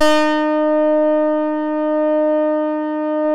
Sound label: EMU MODULAR, modular syn